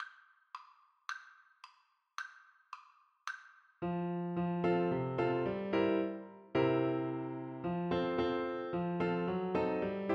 horn, French Horn

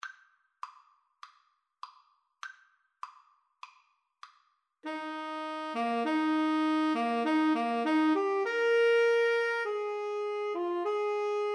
eine kleine Tenor Sax Duet

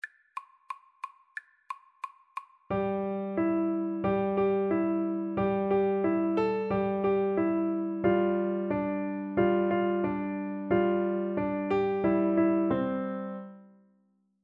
rain rain, Piano Duet

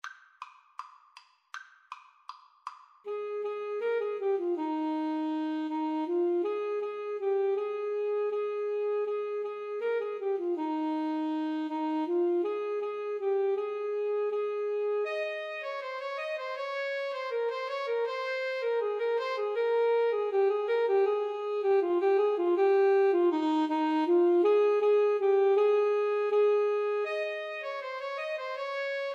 Alto Saxophone Duet

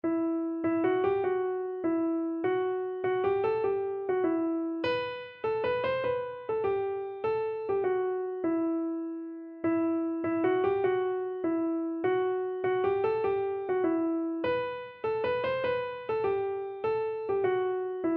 deep love, keyboard

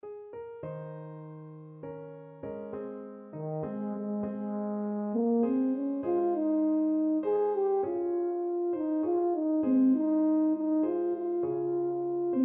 Shenandoah, tenor horn

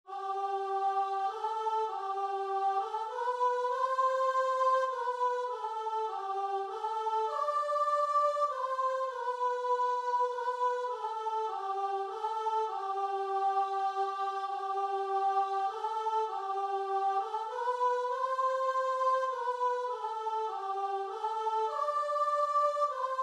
love divine, guitar and vocal

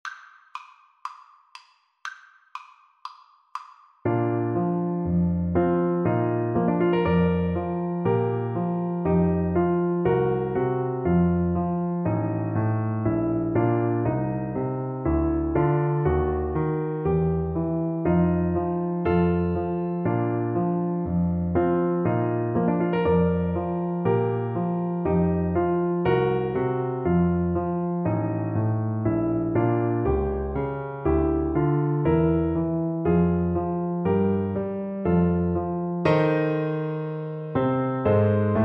reed pipes, Trombone

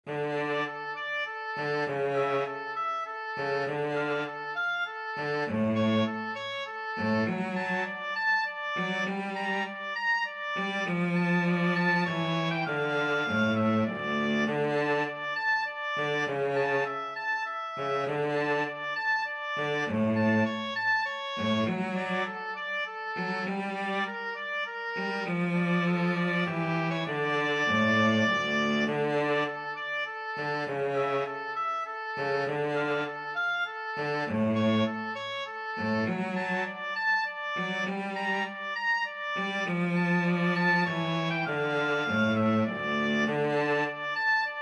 oboe cello

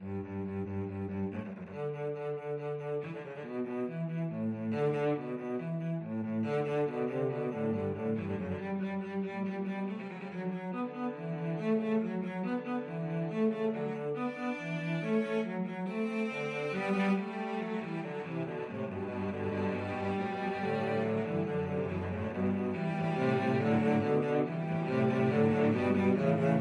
cello quartet

